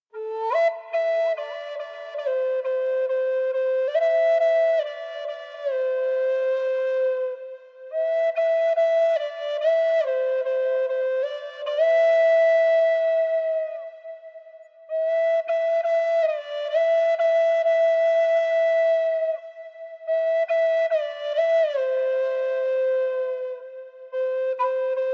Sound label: Flute, Native American Flute